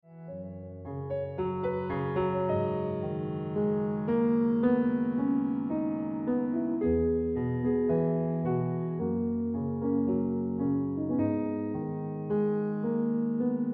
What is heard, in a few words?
Ringtone